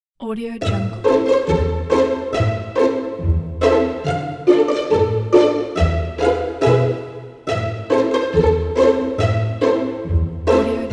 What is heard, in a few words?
comedy fun; eva-kissmusic